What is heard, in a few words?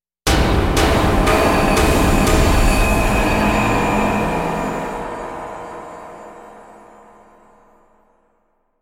smashing kettles